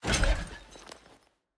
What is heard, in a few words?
Chomper Put Down